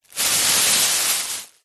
foley plastic bag thin empty movement rustle handling short